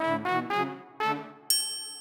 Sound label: Fanfare; UI